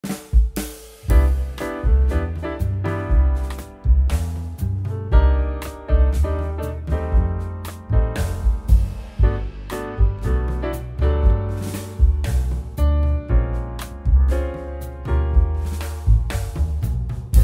sad song ringtone

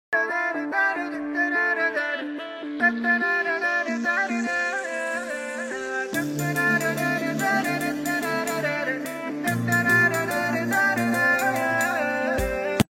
bgm ringtone instrumental

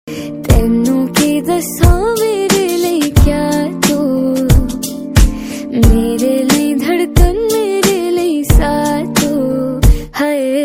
song female version

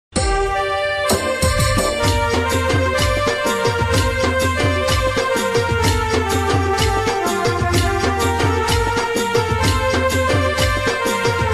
instrumental ringtone

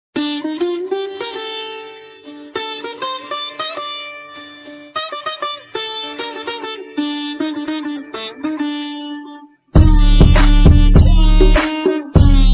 music ringtone

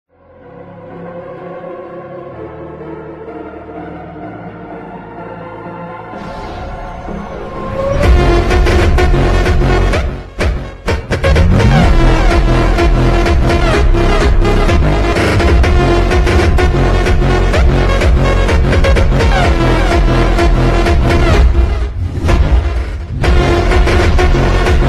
attitude ringtone cool ringtone

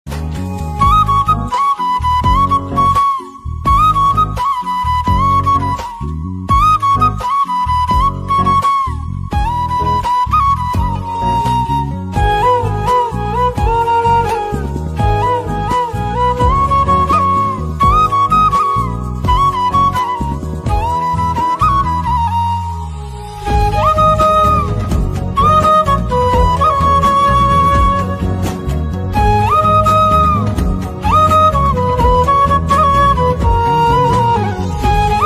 flute version ringtone

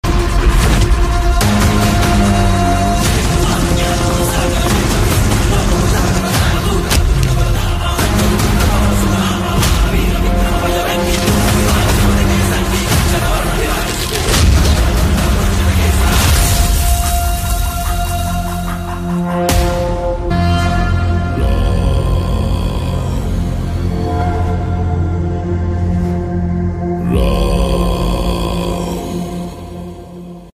bgm; hanuman chalisa

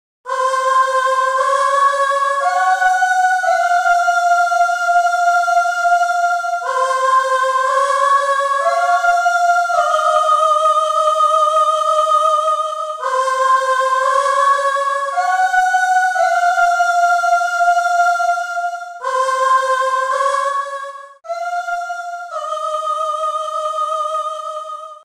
tune piano; jadoo; koi mil gaya; aliens

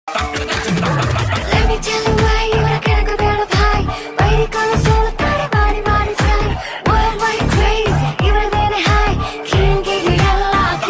bgm ringtone; ghost; dr shivarajkumar; anupam kher; prashant narayanan; jayaram

